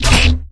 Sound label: Vehicles Generic; unreal development kit